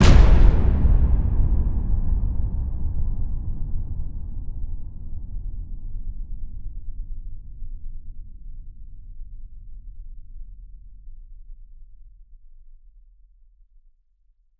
STING, Cinematic Metal Impacts; boom library

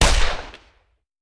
Hand Gun; Sound Ideas; Guns

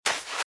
Footsteps - Sand - Dry - Run - Left; RadwinIsland

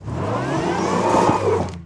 Auto Tires Peelout Ice; Automobiles

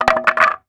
Wood 2x4; Tools; 1001 Sound Effects

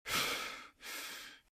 Breathe Breathless; Eden; RadwinIsland

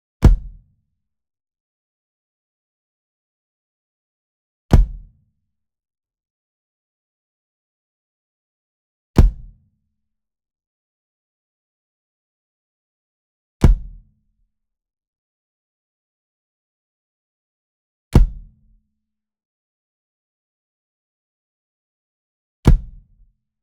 SMALL DRUM Kick Drum Hard Hit Near; boom library; Cinematic Strikes Construction Kit

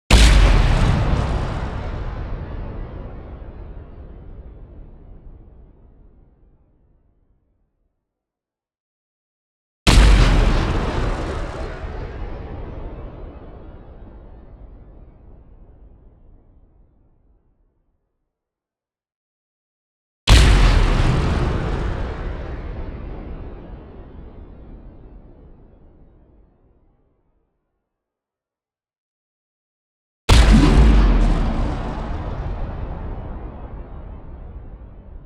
EXPLOSION LONG Tail Pitch Ascending; boom library; Anime Essentials

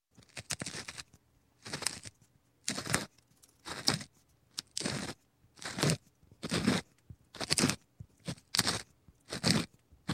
Pepper Grinder Grinding Pepper; Sound Ideas 2000 Series

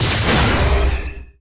Pickup Scifi; SFX Kit

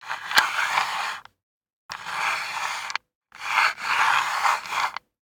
DICE on Wooden Tray, Slide, Standard, 4 Four Dice; Samples; ArticulatedSounds; DICE Sfx Party (Extended)

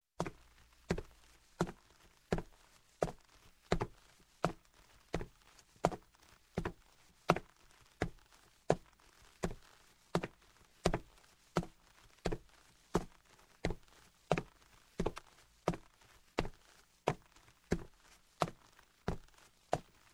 Footsteps, Hardwood - Sneakers; Sound Ideas 2000 Series

